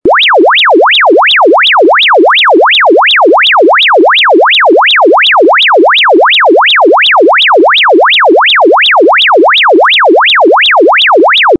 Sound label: alert